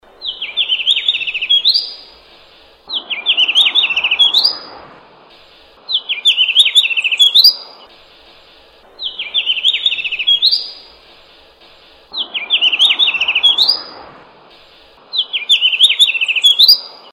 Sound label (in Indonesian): suara kacamata makasar